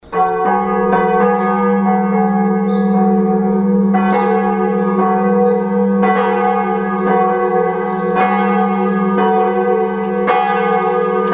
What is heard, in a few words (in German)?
Glockenläuten